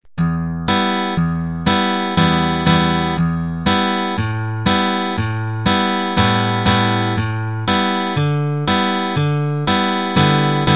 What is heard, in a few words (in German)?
Tonleiter